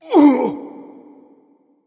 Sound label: grunt hurt; smite; voicepack; hun batz